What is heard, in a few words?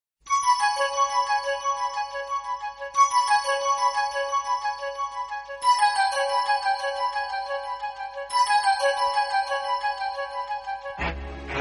new love tune background music tone ringtone